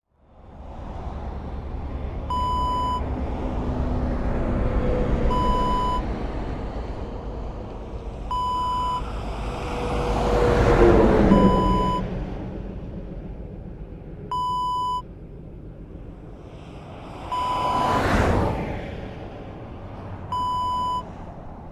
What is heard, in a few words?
truck passing